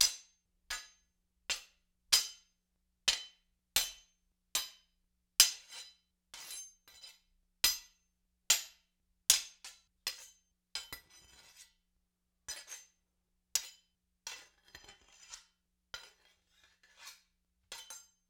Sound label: Spear Norse Sword Blade on Blade, Weapon on Weapon; StillNorthMedia